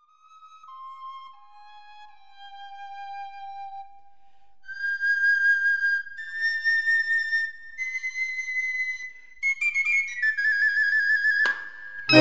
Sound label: Cobla, enregissardanes